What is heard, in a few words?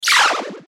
Flash Animation Multimedia; Web Interactive; Button Sound Effects; Blastwave; Technology